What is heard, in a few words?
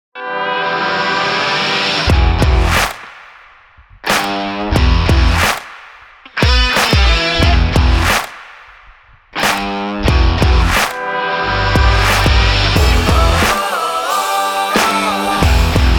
Thunder Rock; MagikStudio; rockpop